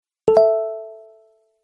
tin tin soft music message tone ringtone, sms ringtones